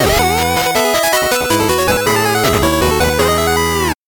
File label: 8bit jingle slow